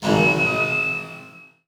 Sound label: Transition Hit Impact Metal; Production Element